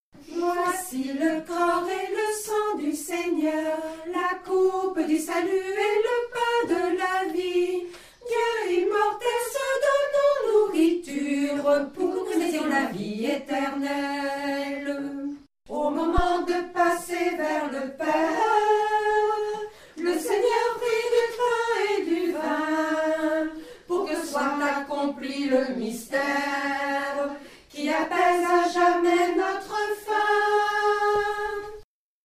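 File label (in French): Voici le corps et le sang Soprano, chœur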